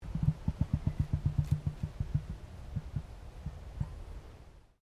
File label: Bird Emu Queensland Throat Noise; Birds in Queensland; Animals